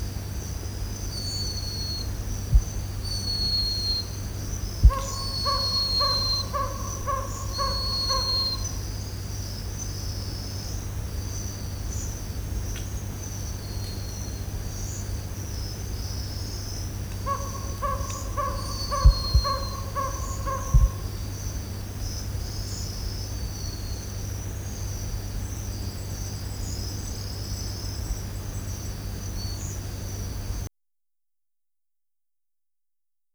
whistles, non-gibbon

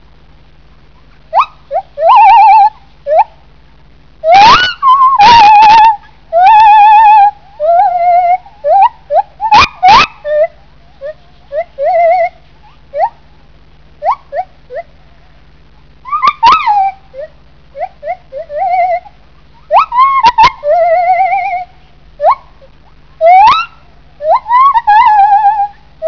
lar gibbon male

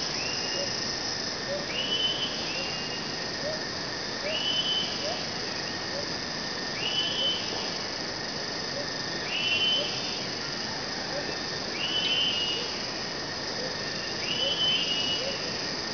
mol Disturbance, gibbons

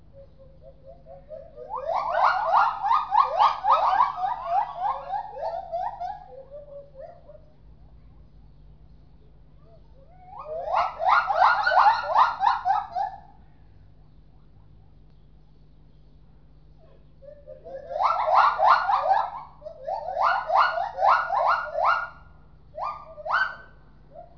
Communal, gibbons

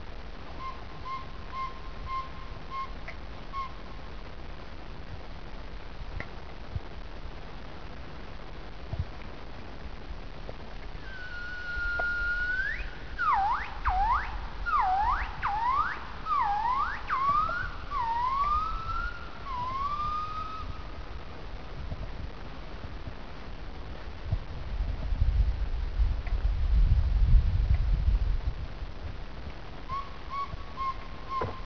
conc Male, gibbons; Male solo song, Xujiaba, Ailao mountains, China, 25 Aug. 1990.

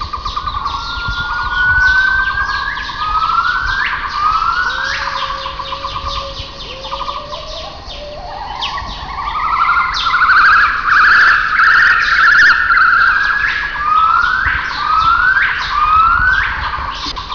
Duet, gibbons

